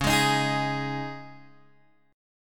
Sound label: Db Suspended 2nd Guitar Open G